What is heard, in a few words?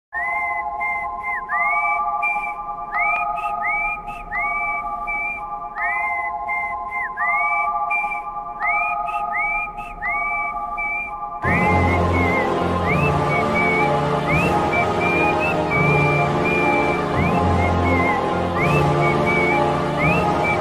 Whistle BGM; ANIMAL; Telugu